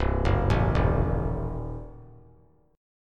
C Diminished 7th down; arpeggiated; JGuitar

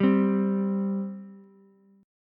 C 5th C Ukulele; C5 strummed

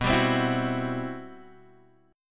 C-Major Sharp 11th B, guitar

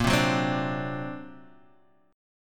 D Augmented 7th, chord

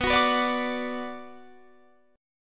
Gb Suspended 4th Sharp 5th B, guitar

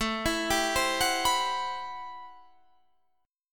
A Minor 13th A Guitar Half Step Down

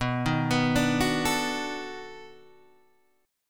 B 7th Sharp 9th, down, Guitar, Open G

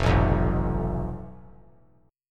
F Major Flat 5th; FMb5 strummed; JGuitar

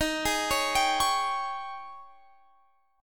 G sharp Major 7th Suspended 4th, D sharp

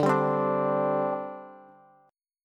D 7th Suspended 4th D Bass; strummed; JGuitar

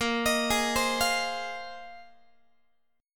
A sharp 9th Suspended 4th, Guitar; arpeggiated; x,18,18,18,16,16; chord; JGuitar

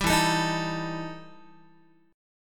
E Minor Major 11th E Guitar; EmM11 strummed; 10,12,12,13,13,x

